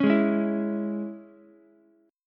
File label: G sharp Suspended 4th Sharp 5th G sharp, Ukulele; G#sus4#5; strummed; JGuitar